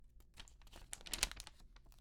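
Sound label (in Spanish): Página libro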